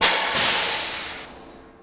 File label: pod airbrakes off